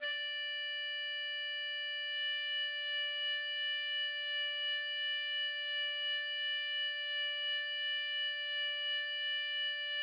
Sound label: 2 Tenor; Hiljaa, hiljaa; 2 Strophen